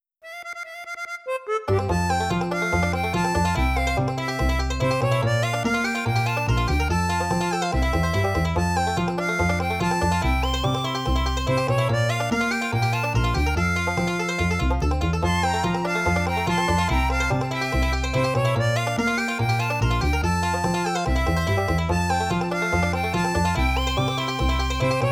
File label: band bluegrass version; stardew valley ost